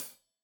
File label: Hat Tight, Hi-Hat; Deeply Sampled Drums